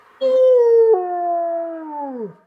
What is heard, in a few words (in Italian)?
lupo; Inquisitor 2